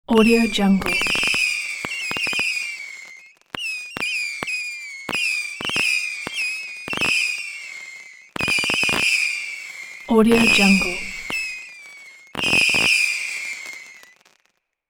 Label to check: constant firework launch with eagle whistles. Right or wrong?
Right